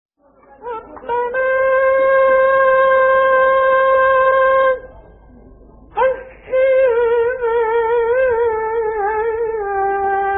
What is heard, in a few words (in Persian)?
فراز زیبای تلاوت آیه سوره ابراهیم; آیه 44; استاد مصطفی اسماعیل